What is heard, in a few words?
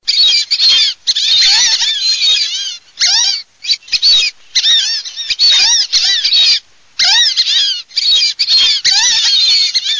jaguazin, birdsound